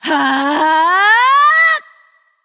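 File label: nonverbal battlecry; smite; voicepack; izanami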